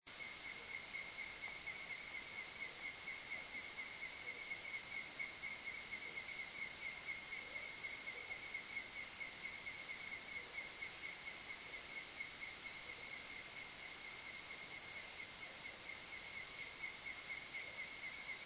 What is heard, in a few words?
Bat